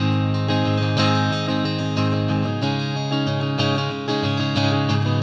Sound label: Acoustic Guitar; Guitars